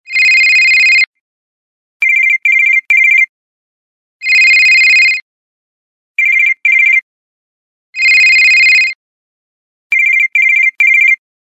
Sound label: cell phone